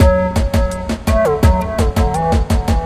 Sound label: Interlude; Ice Breaker